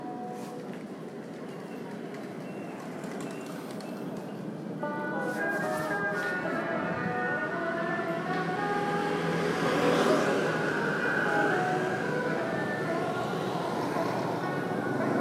Dám ma Funeral

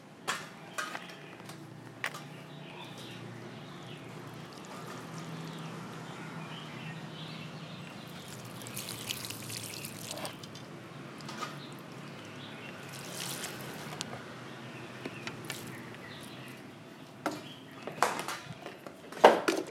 Mrs Nguyen watering the plants

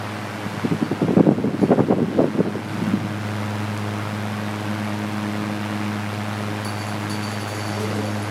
Máy quạt Fan

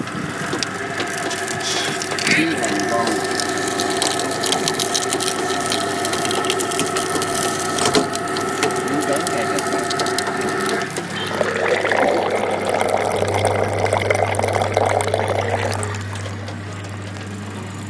Máy xay nước mía Sugar cane press

